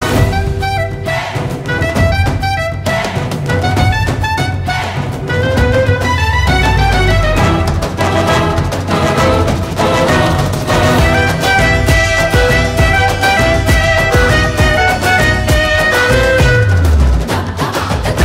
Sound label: Instrumental Theme Ringtone; Atrangi Re